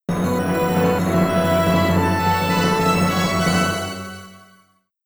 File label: Level Start; Barbie and the Three Musketeers